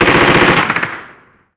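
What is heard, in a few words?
gun mp5 medium; ModernWarfare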